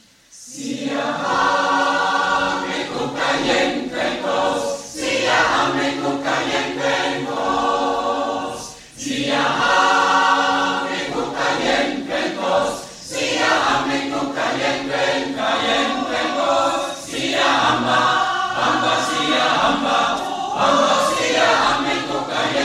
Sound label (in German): Siyahamba Chorgemeinschaft